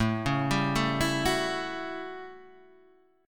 A 13th, Guitar Standard, chord; arpeggiated; JGuitar; 5,4,5,2,5,2

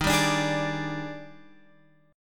D sharp Major 11th, guitar chord

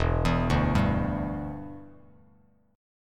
E Minor 7th Bass Standard; arpeggiated

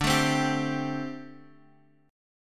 A Minor 7th D; strummed

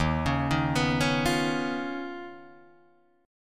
D sharp 7th Suspended 2nd Sharp 5th Guitar Drop D

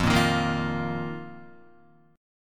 F 7th Suspended 4th F down Guitar

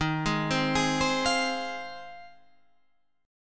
F Minor Eb; Fm/Eb arpeggiated; JGuitar

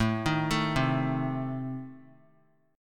A Diminished, down Guitar; Adim arpeggiated; JGuitar

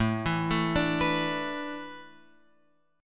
A Suspended 2nd Suspended 4th; Asus2sus4 Chord {5 7 7 7 X 7} arpeggiated

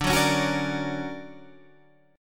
D Augmented Major 7th down Guitar Open G

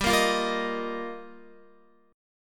G Minor 7th Sharp 5th, Guitar; strummed; x,10,10,10,10,11; 4F,4A,5C,5G,5C,6E; Gm7#5 Chord; JGuitar

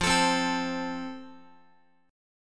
F Major, Guitar; strummed; x,13,12,10,13,x

